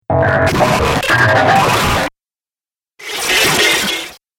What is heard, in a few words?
Dubstep Scifi Effects